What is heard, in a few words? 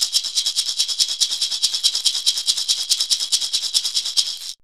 maracas; DRUMS & PERC